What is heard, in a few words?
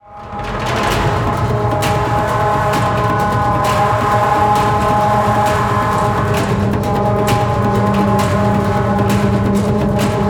Alert; Mad Max